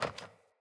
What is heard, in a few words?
Sandals Walk Metal, footsteps; Player